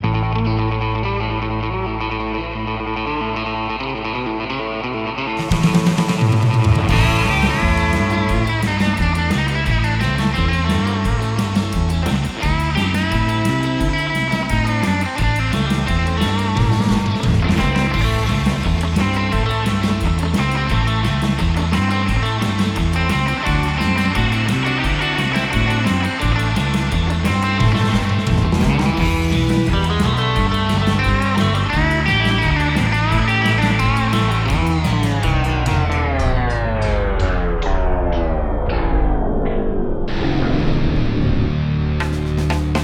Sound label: Surf Bossa